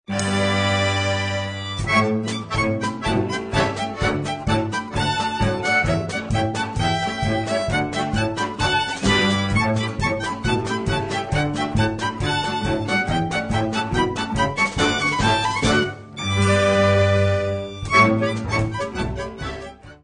Wuide Polka

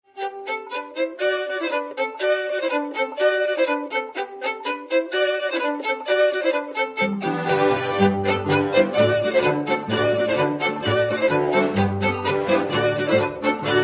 Finnische Polka

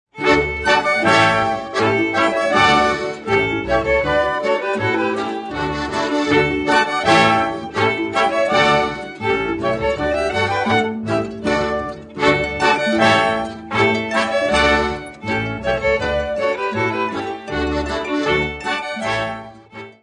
Boarischer

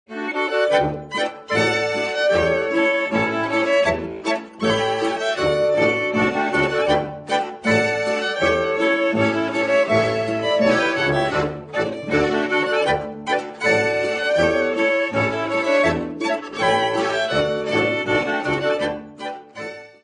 Rehragout Boarischer

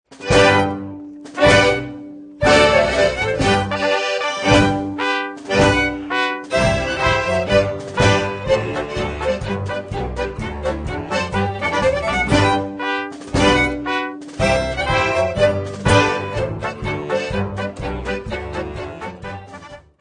Krebspolka

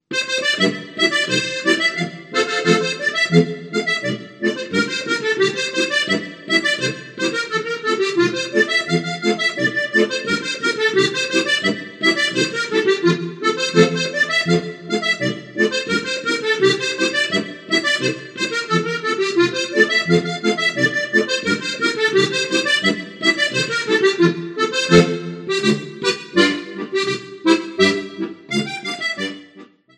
Polka Playalong

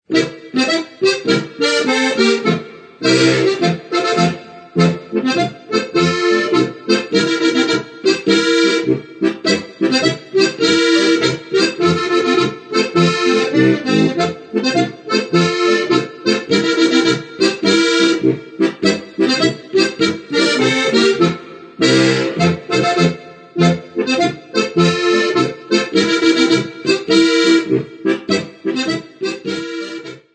Hinterhuglhapfinger Marsch

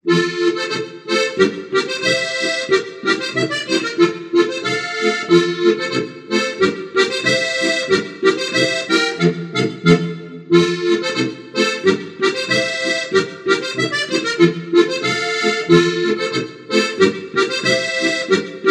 Polka Playalong

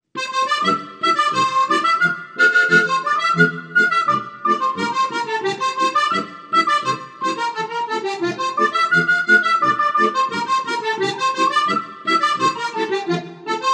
Kasnocken-Polka

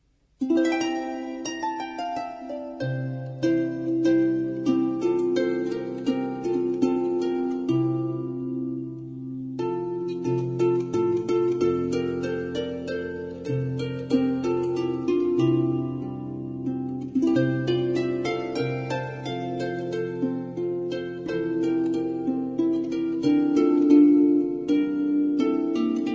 Festliches Weihnachtsmenuett

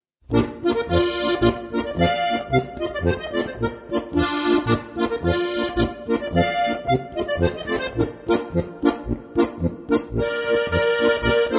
Polka

